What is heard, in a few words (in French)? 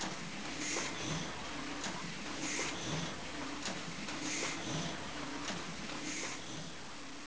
Machines Son